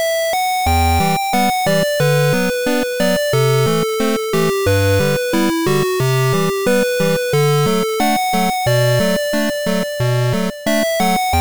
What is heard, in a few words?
8bit Far And Away